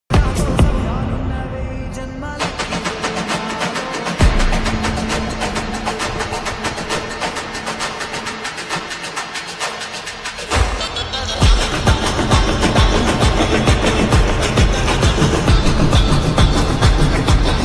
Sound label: song bgm; Kalki